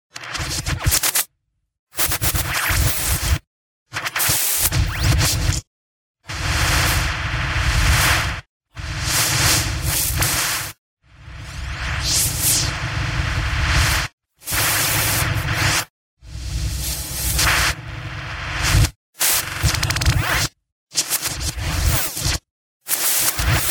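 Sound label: similar to gunshots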